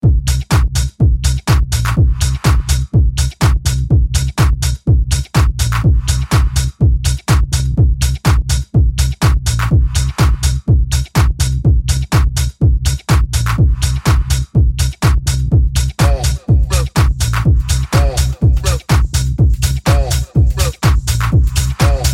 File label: Jackin Tech House; Producer Esssentials